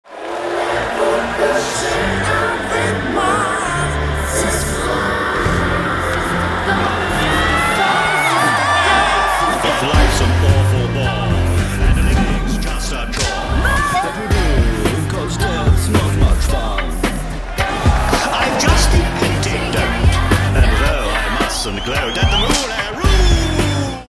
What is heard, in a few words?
rap; zidler